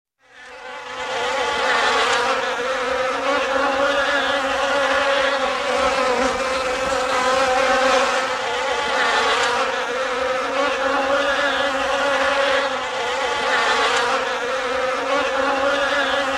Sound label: Swarm of Flies Close Perspective Very Active; Nature Ambiences